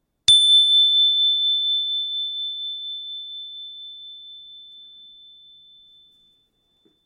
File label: Chime